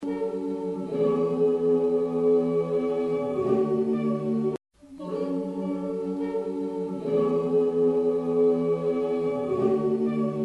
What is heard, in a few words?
ukrainian lullaby